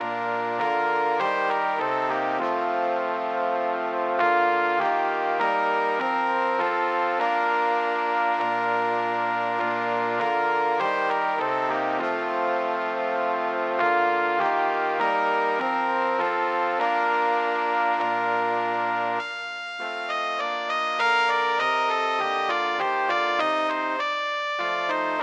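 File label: ding dong 2 trombones; brass quartet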